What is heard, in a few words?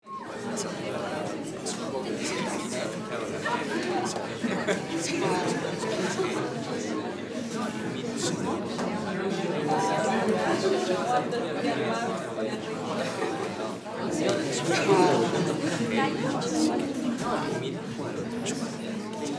museum ambience London UK; National Gallery